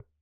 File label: Bongo Hi Slp; Percussion